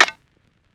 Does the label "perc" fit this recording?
Yes